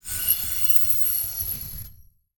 Title Transition Grinding Element; Production Element

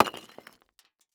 Impact Concrete Drop On Concrete Single

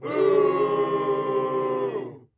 Human Crowd Boos

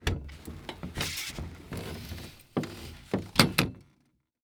Table Break Away Table Close, Household

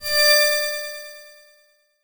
Transition Musical Tone Short; Production Element

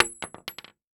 Weapon Bullet Shell 7MM Dropping On Hollow Wood; AdobeCC

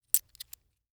Foley Coins Single Coins Cling While Counting

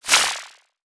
Multimedia Internet CD-Rom Flash Electronic

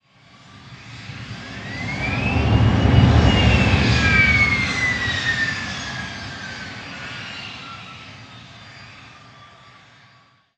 Airplane Jet Engine On Runway; transportation

